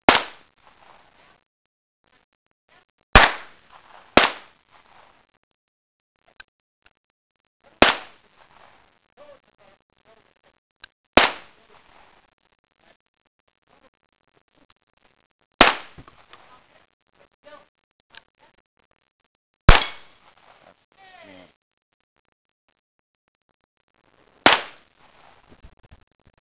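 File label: GUNFIRE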